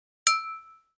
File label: glass